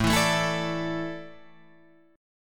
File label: A Suspended 4th, Guitar Drop D